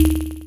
alert generic; ui; starruler

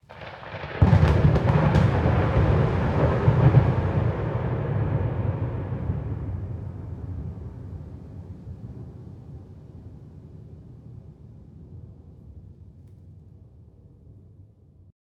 slipstream open; starruler

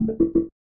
order refresh; orders; starruler

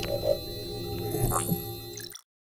espionage probe; starruler; artifacts

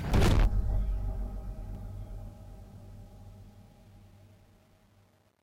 warp boom; starruler

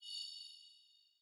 ping; ui; starruler

